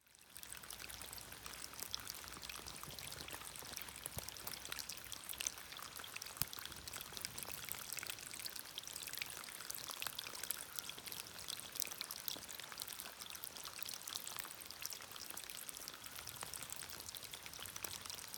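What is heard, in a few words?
rain stream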